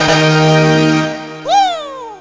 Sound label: achievement; KSP; Romfarer